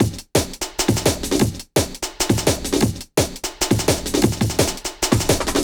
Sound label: Some Drum, Drum & Bass; Fluid Dynamics